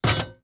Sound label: pipe hit metal; Star Wars Obi-Wan; XBOX